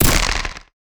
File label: big bow